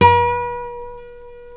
C 5 nylon, Guitars

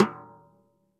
stickshot snares off; JazzFunkKit

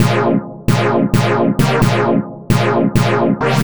Stab 132 A#, Club Techno Stab Loops; 90 sSampleCDs

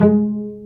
Vcs Pz dry; STR Vcs Marc-Piz

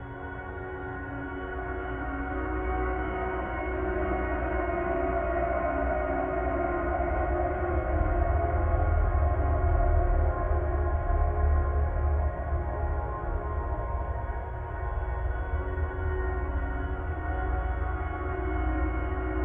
Guitartronics, Ethereal Textures; Harmonic Serpent; Sonic Foundry (Sony Creative Software); Ma Ja Le Ethereal Textures

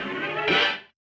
Yamaha DJX 2B, Hit Brass

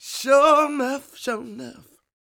SING W, Vocal Planet Blues & Soul, BLUESY; ILIO

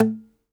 DOMRA, Plucked Strings

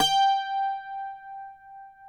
CUATRO; Spectrasonics; Hans Zimmer Guitars VOL-2

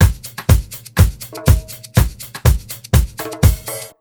BEAT, Roots Disco; USB Soundscan vol.32; AKAI

